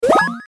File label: click